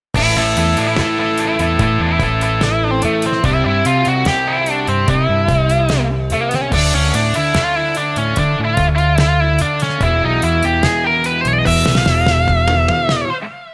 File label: guitar pattern